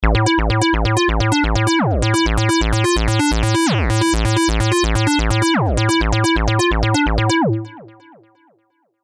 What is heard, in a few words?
Acid Loop B 128 BPM; Sylenth1